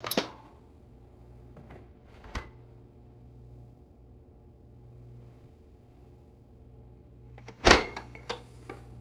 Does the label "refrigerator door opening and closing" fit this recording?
Yes